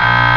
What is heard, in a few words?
Tuning Fork buzzer; Widget Workshop; A Mad Scientist's Laboratory (1996); Maxis